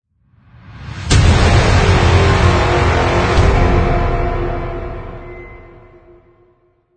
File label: Epic Logo Short